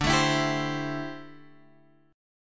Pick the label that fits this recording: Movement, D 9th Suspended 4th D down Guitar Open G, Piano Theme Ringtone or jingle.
D 9th Suspended 4th D down Guitar Open G